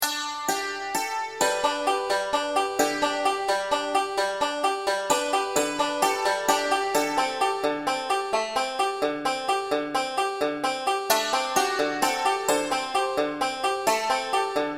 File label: banjo; beginning 5 string; down in valley